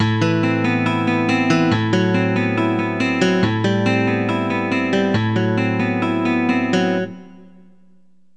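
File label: steel guitar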